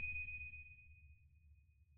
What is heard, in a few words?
sonar Tail Suit Far Directional; Barotrauma; Real Sonar